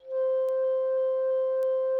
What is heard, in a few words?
Instrument